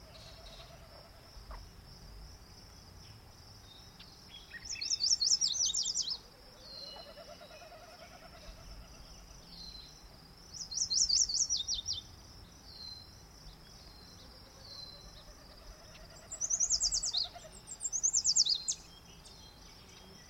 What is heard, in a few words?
yellow warbler